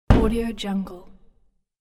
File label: snowball hitting window from indoor; domestic sounds